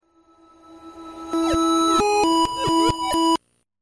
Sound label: healed